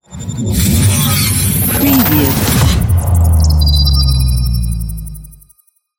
hybrid logo

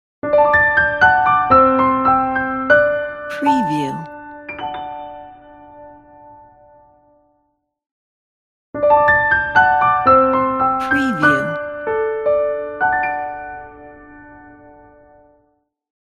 clean elegant piano logo

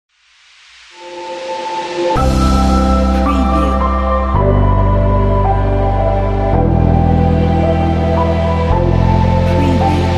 deep piano logo

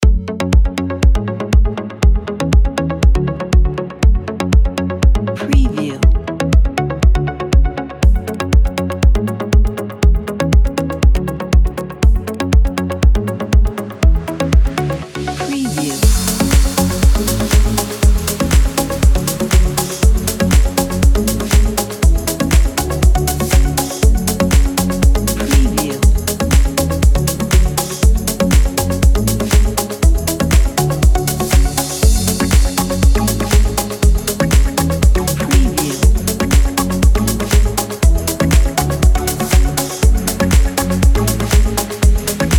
summer vibes

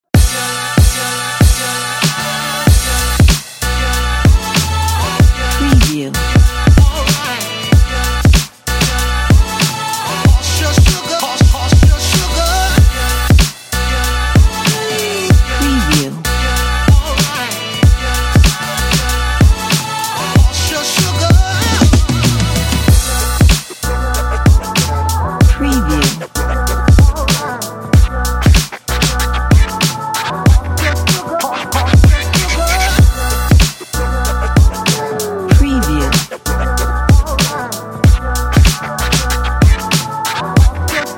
stylish hip hop

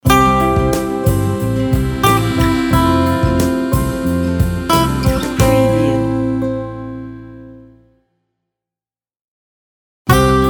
acoustic folk logo

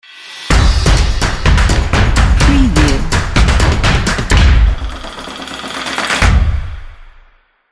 stomp logo intro

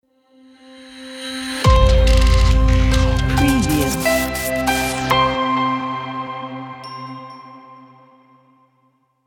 glitch piano logo

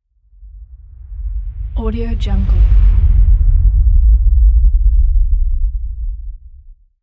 sub bass whoosh

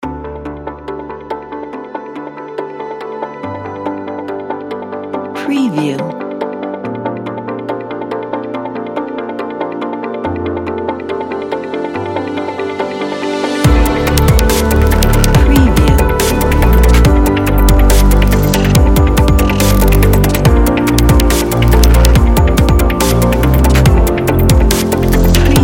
future technology

